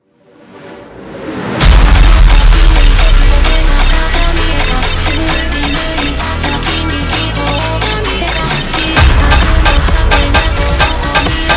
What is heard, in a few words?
Vocaloid Funk slowed